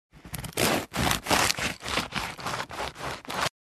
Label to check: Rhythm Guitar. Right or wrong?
Wrong